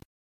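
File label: silence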